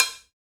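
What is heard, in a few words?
percussion cow, Latin; Roland - Rhythm Section; Latin 4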